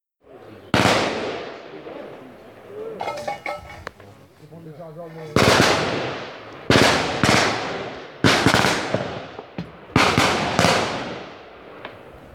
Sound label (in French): Famas rafale 3 coups